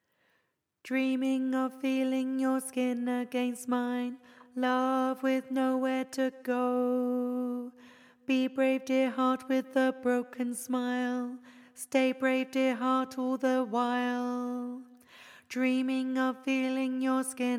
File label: Bass